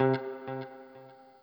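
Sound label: noodle short, guitar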